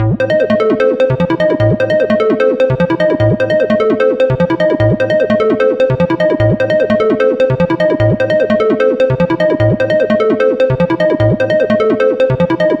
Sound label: Dusty Square, acid techno trance; acid techno trance vol2